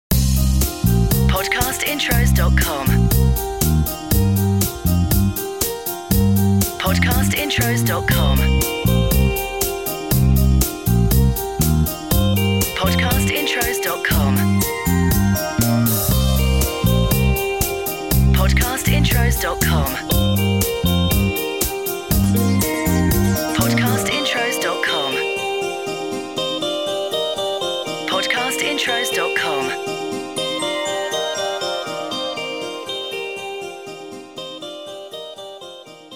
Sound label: CHILL OUT; Podcast Watermark